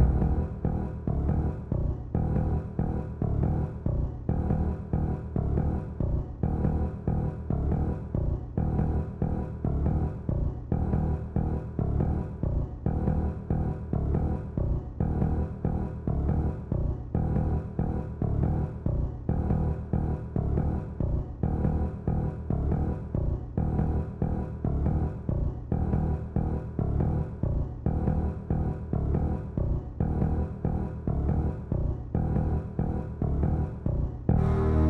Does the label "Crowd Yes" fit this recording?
No